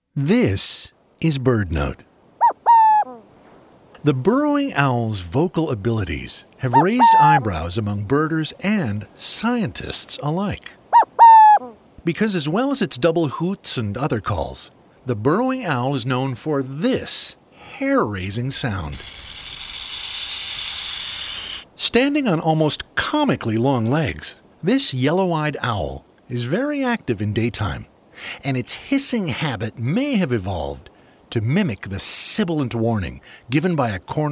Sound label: Burrowing Owls Hiss Like a Rattlesnake